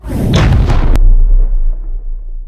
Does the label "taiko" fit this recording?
No